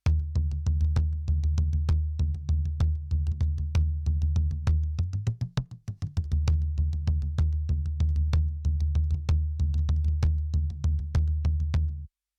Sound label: bodhran